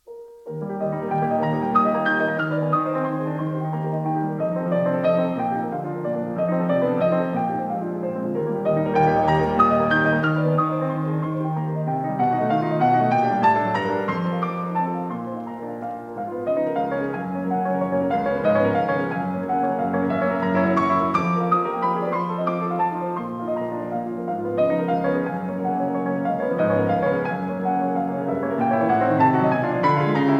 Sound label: Preludes; Chopin; Op28; Lympany